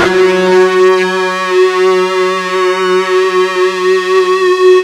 FUZZ F#2, Guitar; East West; Steve Stevens Guitar Samples Collection

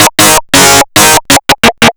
synth seq; Big Beat Samples & More; AKAI; ESO SEQS KIT

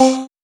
Synths Root C

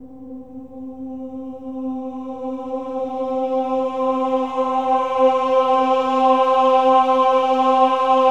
OH-AH C4, choir; Spectrasonics; Symphony of Voices

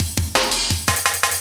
loop, Drum & Bass, 170 BPM

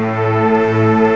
BIGSTRN, LOSTRING; CMI Sound Library Rev 1.3

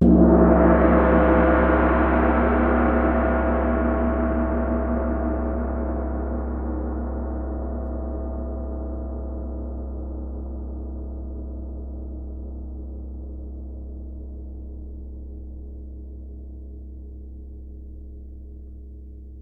GONG BIG; Miroslav Vitous - Percussion & Harp

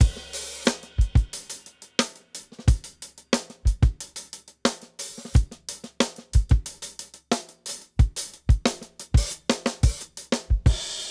Temporary Bliss 90 bpm, drums; Basement drums vol 1

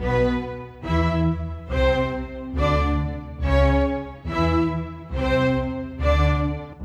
Strings; Sonic Foundry (Sony Creative Software); Orchestral VOL-2

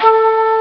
d50 flute, Amiga Soundtracker Sample Packs

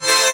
Synth Shot

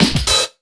ROCK 132, Big Beat Drumloops

